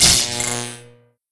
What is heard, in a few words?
zap; casualtiesunknown